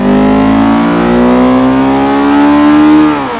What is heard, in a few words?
second, vehicles